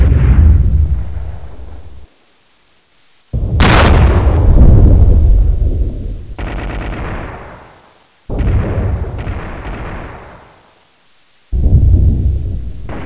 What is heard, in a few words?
background; nightalia